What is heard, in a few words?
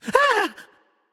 nv breath; smite; voicepack; ganesha